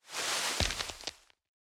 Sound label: tree leaves; particles